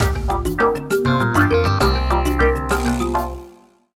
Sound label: Savanna Wind, ringtones; Fujitsu F-42A